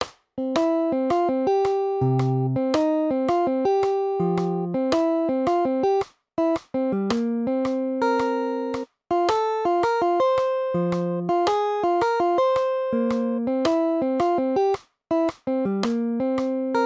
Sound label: solo arrangement turnaround, guitar; Triplet 8th; 4/4; can all be played with a pick only